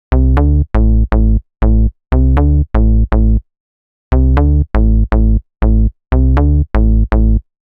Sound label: Loop Bass 120 A, Deep House; Drives; Ghosthack; Advent Calendar 2019